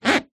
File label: foot move squeak in flip flop